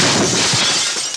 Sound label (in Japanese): ガラス割れる音; マジカル刑事